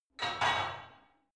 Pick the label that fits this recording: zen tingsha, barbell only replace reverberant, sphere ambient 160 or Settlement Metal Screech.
barbell only replace reverberant